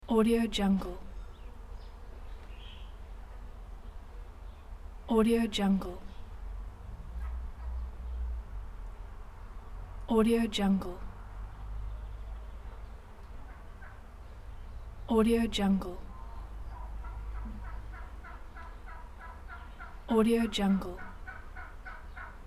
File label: village turkeys activity traffic distant